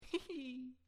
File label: ouch